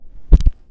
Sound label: Thump